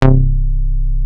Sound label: SH MINI TYPE delay, ROLAND SH-101; Rubber Chicken Software Sample Bank